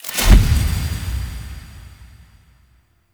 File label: Energy Thread Activate; Warframe; Apostasy